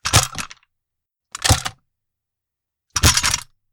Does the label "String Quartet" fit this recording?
No